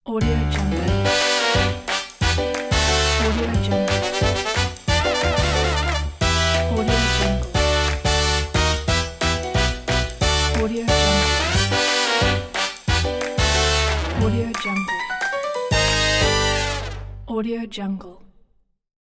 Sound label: happy logo